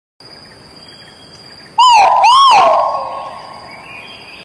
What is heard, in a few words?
Great argus; پرنده آرگوس بزرگ